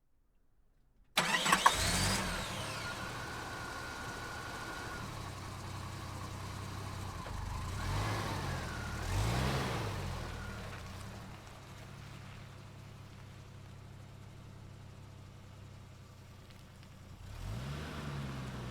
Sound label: suv back out and return